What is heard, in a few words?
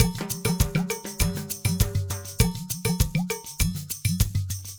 Ensemble, Latin Percussion